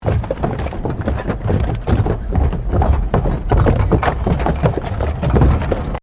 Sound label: Wagon Horsedrawn 4 Horse Medium Wooden Bridge; Carriage; Carriages; Vehicles